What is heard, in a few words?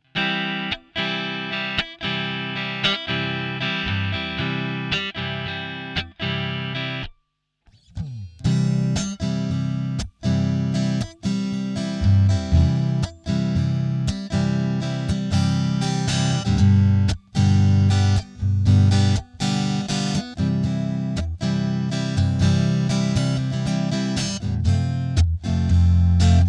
acoustic modeler; behringer pedales guitare